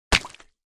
lucky mug fall down; finn

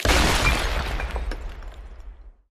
sym Explosion; elements

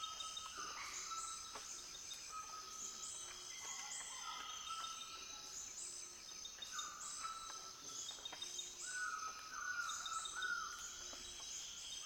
jungle; rise of maya

